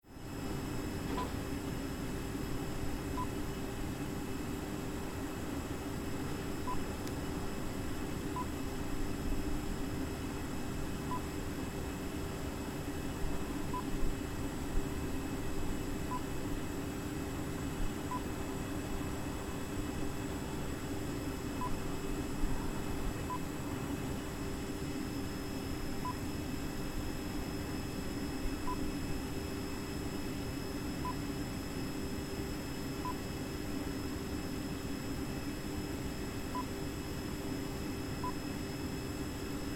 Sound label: Train Station Stationary Train